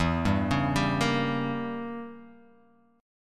E Diminished 7th E, Guitar Open F; Edim7 arpeggiated; JGuitar

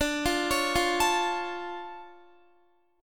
D Minor Major 7th D down Guitar; DmM7 arpeggiated; JGuitar

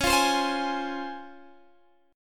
C sharp Major 7th C sharp Guitar Open D

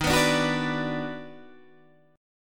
Eb Minor Major 7th Sharp 5th, Guitar Open D

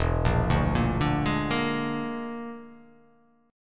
E 7th Flat 5th, E7b5 chord; arpeggiated

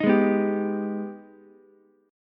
Ab Minor Major 7th, down, Ukulele; AbmM7; strummed

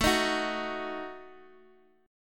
F sharp Minor 6th, Guitar

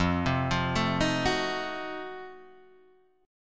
F 7th Flat 9th, Guitar Open D

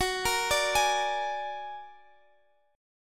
A sharp Augmented 7th F sharp

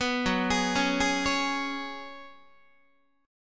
Gb Suspended 2nd Suspended 4th, Guitar; Gbsus2sus4 arpeggiated; 14,14,11,11,12,12

